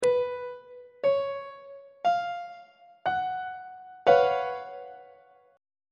g flat major 7th suspended 4th 1st inversion on treble clef